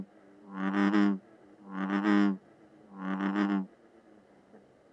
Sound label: Bullfrog